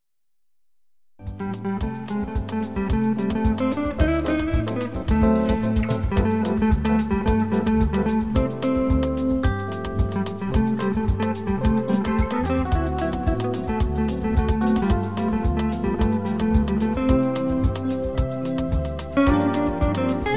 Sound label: jazz & samba, solo guitar